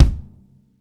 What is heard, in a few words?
thick bass drum single hit a sharp key